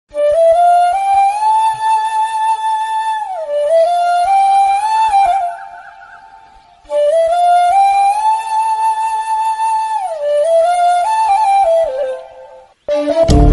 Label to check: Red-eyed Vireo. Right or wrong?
Wrong